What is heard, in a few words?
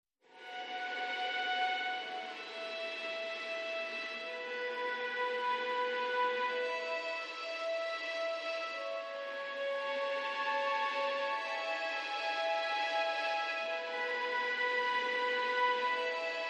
High Strings Only